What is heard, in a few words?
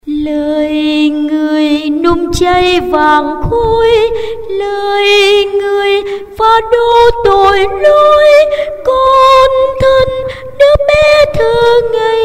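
soprano tenor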